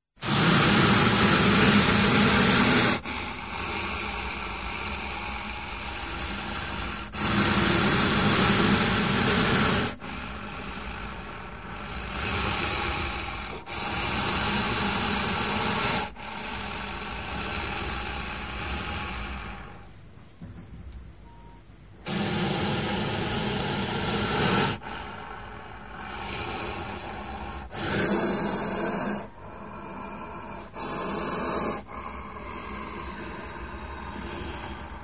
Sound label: Snake Hissing